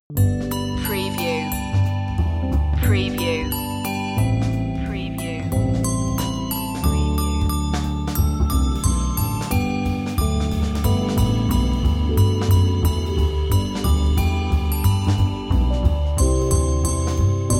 jingle bells jazz handbells

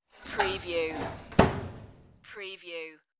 close locker door

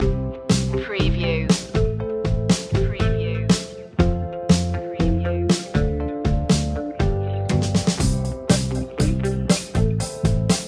joyful pop instr

